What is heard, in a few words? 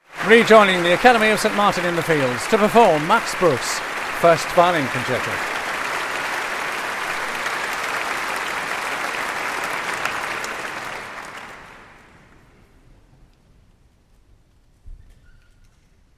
violin concerto no 1 in g minor; Max Bruch